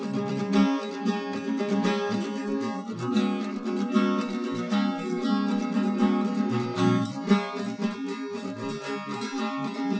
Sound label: nodrums inst sim; SASSEC07